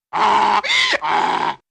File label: Donkey Bray heehaw